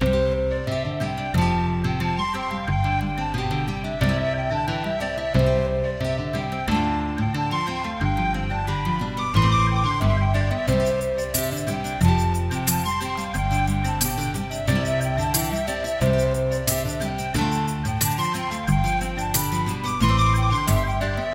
music game win; northern tale 2

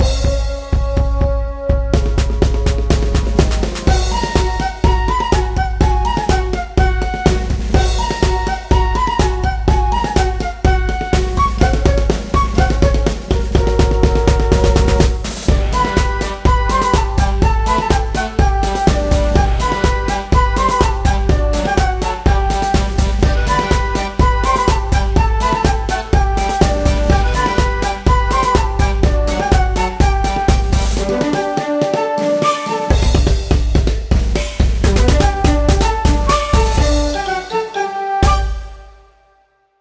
Rhythmic; Guardian Tales

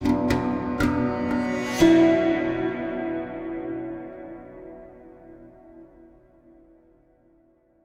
End Stinger

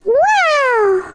Classic Miss Sound; Jolly Battle; Android

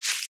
Pack Card Move

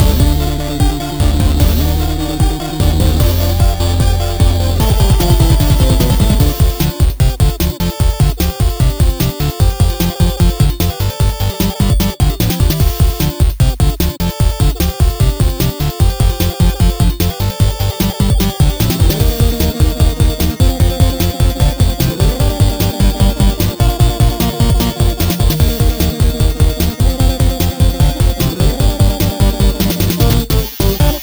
8 bit battle (loop); Invanoid; wii u

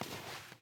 snow step; teardown ps5 windows xbox series xs gamerip 2022

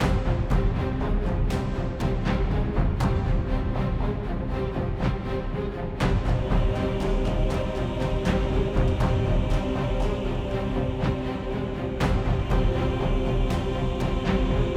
Battle; Eon Altar; Battle MyrthCourt

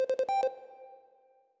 Panel Fans Complete

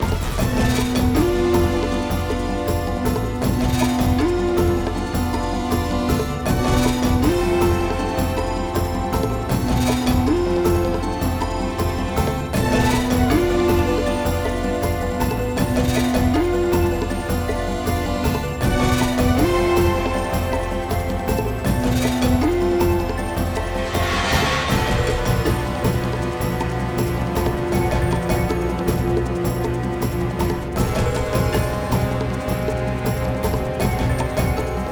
Music Ingame Boss Mammoth Loop; New Joe & Mac Caveman Ninja

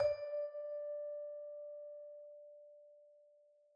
Scale; Second Scale4; tintin reporter cigars of the pharaoh

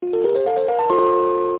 Checkpoint

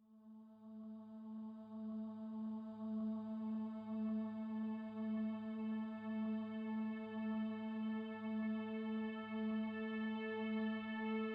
the end - no cello - loop

